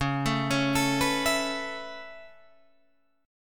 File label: C sharp Minor 7th Sharp 5th C sharp Guitar DADGAD